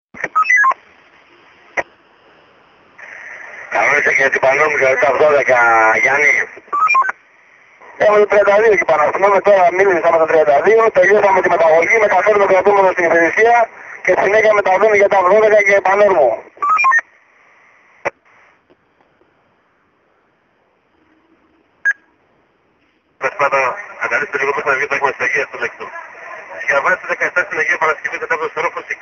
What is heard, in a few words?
police